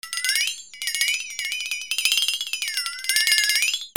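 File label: percussion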